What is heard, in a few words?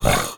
fast out, headcrab breath; reviver